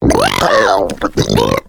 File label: female boomer lurk; voice, idle